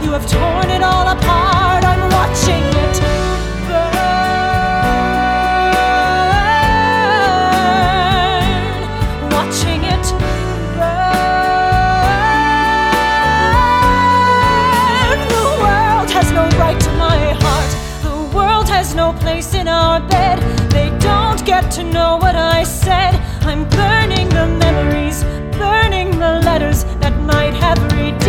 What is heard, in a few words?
vocal